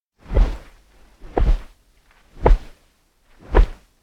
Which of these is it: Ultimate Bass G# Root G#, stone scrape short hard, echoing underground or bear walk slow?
bear walk slow